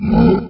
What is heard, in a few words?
raging; Undercroft